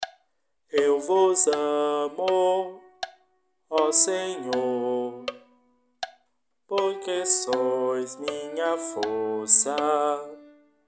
Salmo coro

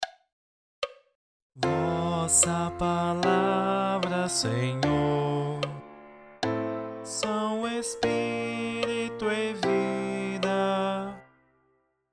coro

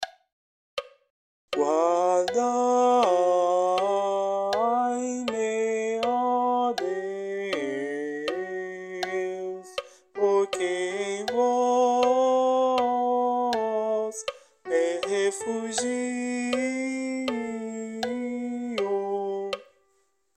Salmo coro

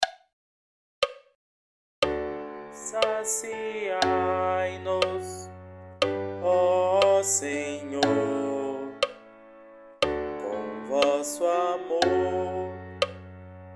Salmo coro

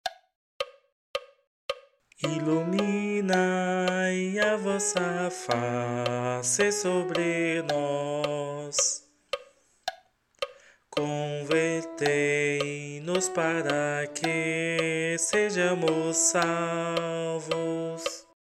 advento coro; 4o dom

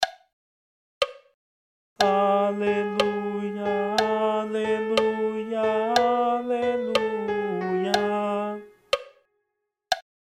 Aclamacao Advento coro; 2o dom

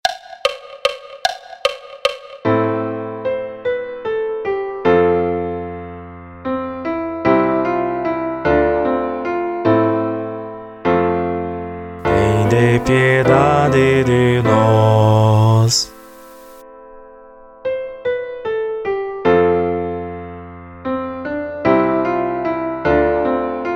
Coro Fração do Pão

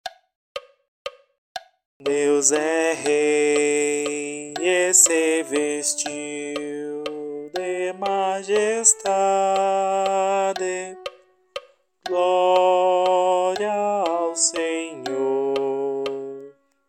Cristo Rei coro

